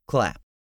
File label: CLAP